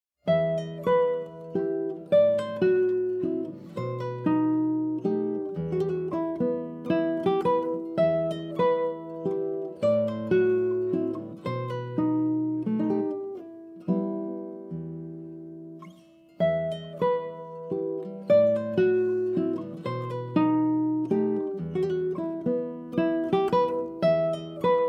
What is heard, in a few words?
Tarrega Mazurka, Chitarra; chitarra classica; Edizioni Musicali Sinfonica